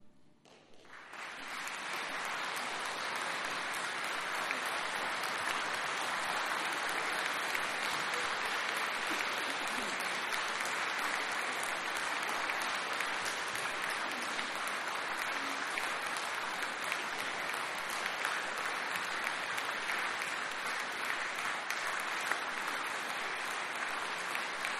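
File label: Applause Hand Claps After, concert hall theater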